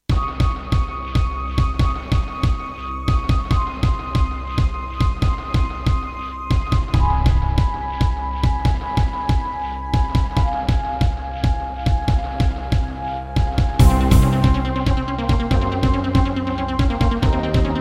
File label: Roland SD-50 GM2 MODE; sigil doom episode 5